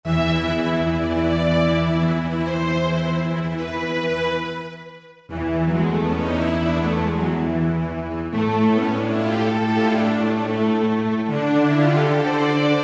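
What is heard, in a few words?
Tremolo String; Roland JV-2080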